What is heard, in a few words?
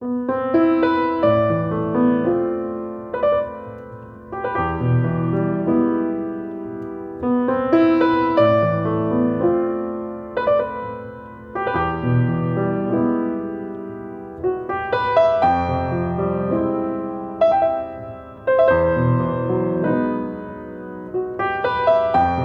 Prelude in E